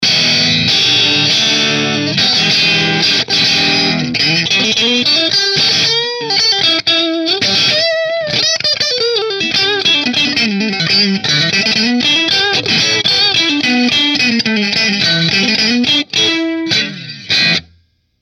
Grosh Strat Position 5 Marshall

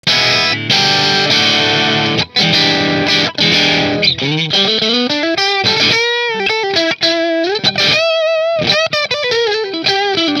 Nola Voodoo 3 p90 driftwood neck Marshall

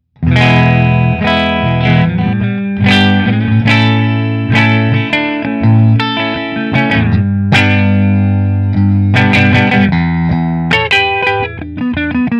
Hamer Aztec Gold Middle Fender

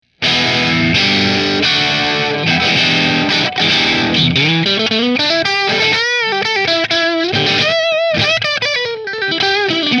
Nola Quilt Neck Middle Marshall; New Orleans Guitars Voodoo Custom Quilt Top

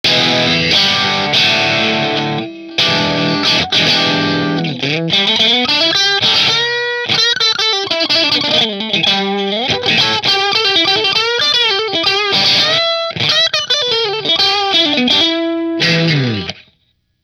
Custom shop strat position 2 marshall, guitars

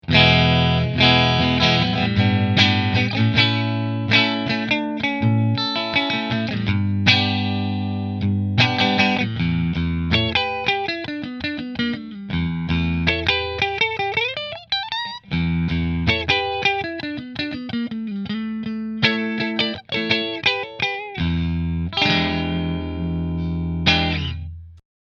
Nola Voodoo Driftwood Middle Fender

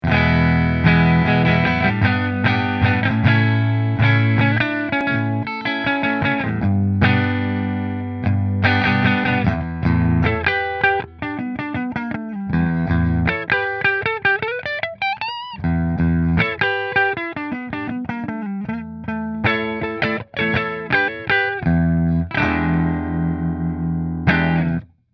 Music Man JP Neck Fender; 2002 Music Man JP-6 Mystic Dream

